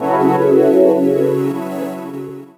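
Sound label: Pad Synth; Equipped Music; Loungin House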